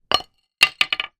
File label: axe put down hard ground; industrial